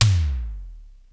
TOM, ELEC.DRUMS; Crypton - DrumBase5000

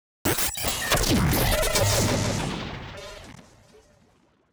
beep boop

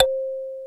KALIMBA HI; CALIMB+STEEL

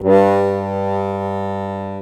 DIATON BA, AKKORDEON, Alpine Volksmusik; Best Service

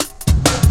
Bouncy Cut, Jungle, Drum Loops 166.5; Zero-G Jungle Warfare 1, 2 & 3

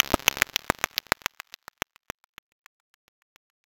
Crisp Dry, FX Atmospheres; Zero-G; Soundclash Nu Dance Electro; Acidized Wavs

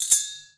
Metal Collander, household rhythm samples Hits

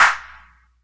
clap, hip; Best Service; Dance Mega Drums

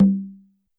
Conga L; YAMAHA RM1X

